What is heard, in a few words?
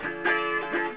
Dmi, AHarp